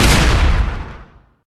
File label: hagexp, weapons